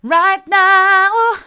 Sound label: right now mid, vocal